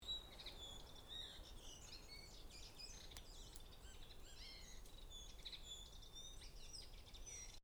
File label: fieldfare chatter